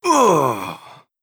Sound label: death groan male